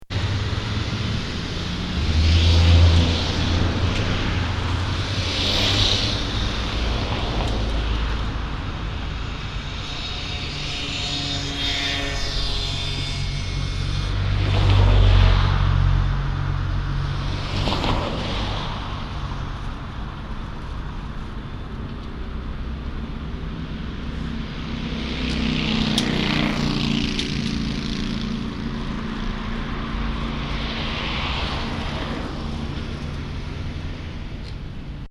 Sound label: Cars, Binaural recording